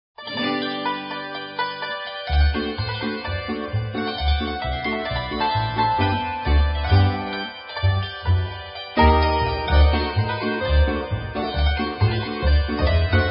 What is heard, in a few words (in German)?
Hackbrett Polka